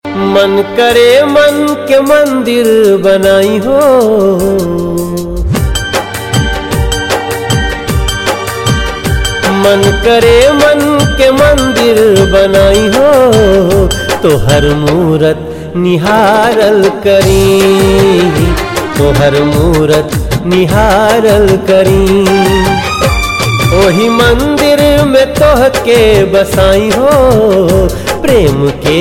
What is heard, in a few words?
BHOJPURI RINGTONE